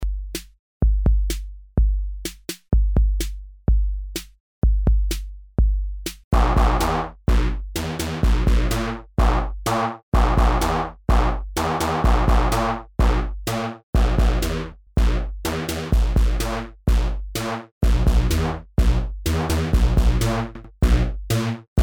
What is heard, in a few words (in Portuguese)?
retomada do riff